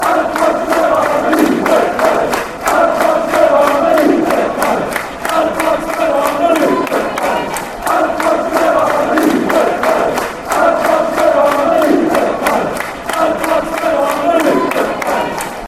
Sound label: red army fanchants; alan pardews; cafc